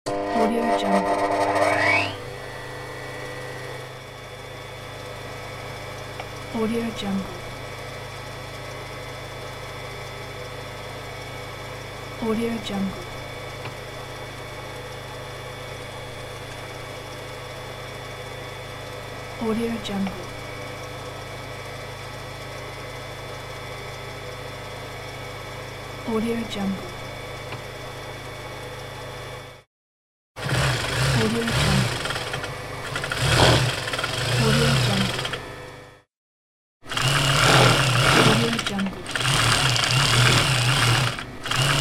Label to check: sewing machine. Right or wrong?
Right